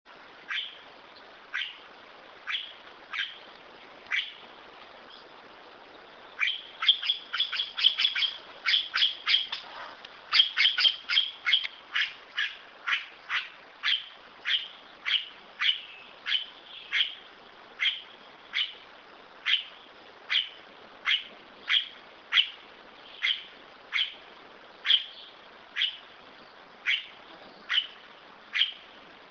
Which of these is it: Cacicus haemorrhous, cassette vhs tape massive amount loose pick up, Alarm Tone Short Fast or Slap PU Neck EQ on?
Cacicus haemorrhous